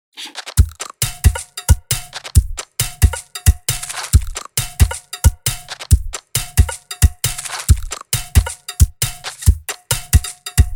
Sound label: pringles drumkit